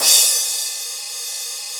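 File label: CRASH CYMBAL; Big Fish Audio; Synth City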